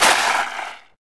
Overture; SNES; Romancing SaGa

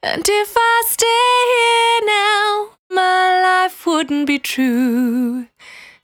Cry 68bpm B, Vocal; Zero-G

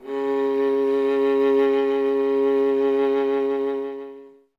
viola C; Miroslav Vitous; Solo Instruments VOL-1

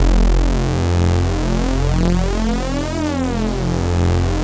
PHAT ACID F2; PHATYACID

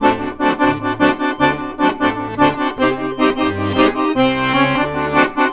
ACC, Balkanish Traditions

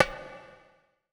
Bongo LO, percussion reverb; MARKYDRUMS

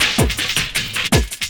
LOOP, 160 BPM, Drum & Bass

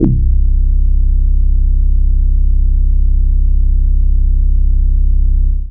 Bass C1, club techno

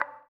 VIBRA WAH, guitar; Spectrasonics; Bizarre Guitar

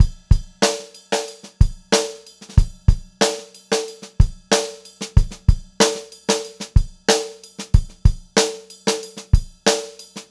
Funk-Groove Drumloops; USB Soundscan vol.38; AKAI; 90 s Sample CDs

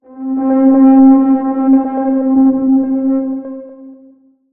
PAD - C; Instrument Oneshots; 99Sounds; Monosounds

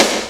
PERC FX

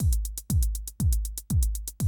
120, Classic Drum Loops

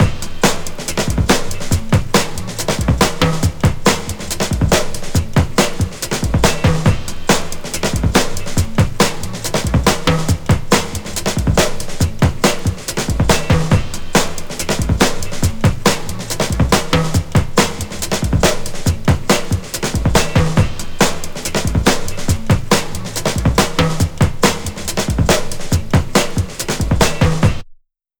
140 Funky 4 Bar, Breaks; Lockdown Breaks

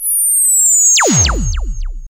SYN FX; X-Static Goldmine 1

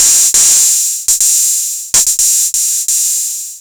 808 tape open hat

